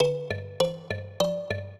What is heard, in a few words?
trio, mbira; Mozart